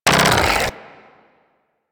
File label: Primary Reload, Weapons; Sapient